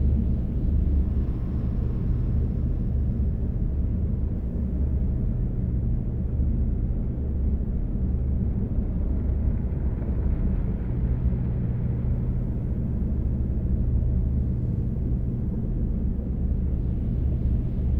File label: titan idle; aquatics